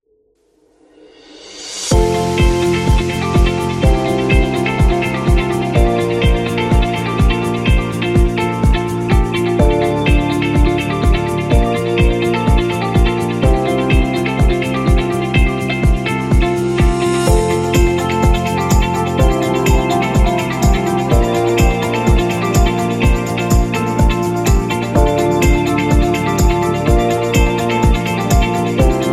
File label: Product Background